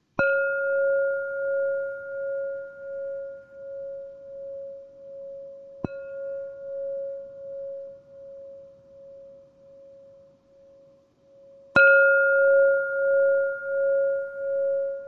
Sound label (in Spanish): amarillo 512g do, cuencos tibetanos